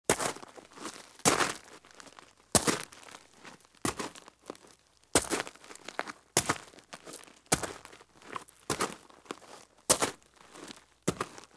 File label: FOOTSTEPS OUTDOOR